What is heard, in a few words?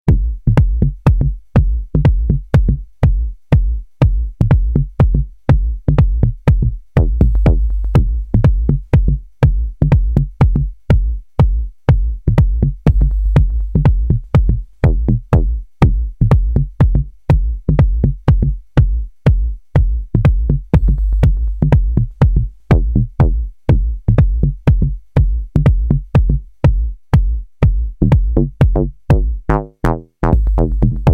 acid forest